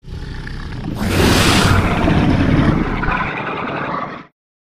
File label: Monster Combine, monsters